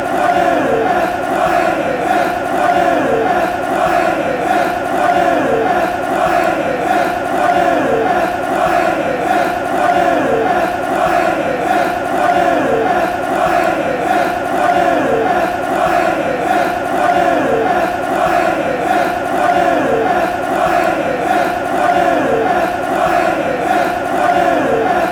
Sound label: come greens, chants; Association Sportive de Saint-Étienne Loire